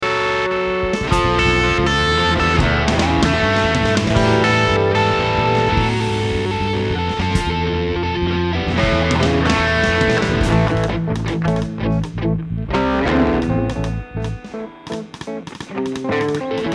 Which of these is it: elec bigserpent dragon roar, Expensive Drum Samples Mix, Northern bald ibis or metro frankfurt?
Expensive Drum Samples Mix